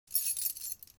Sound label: Keys; Feel; NiceVibrations